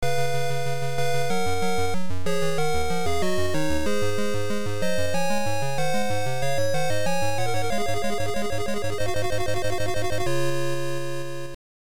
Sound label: Title Beeper; Stifflip & Co